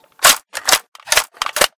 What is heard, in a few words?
AR55 mag in